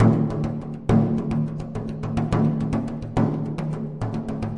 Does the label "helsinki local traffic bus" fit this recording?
No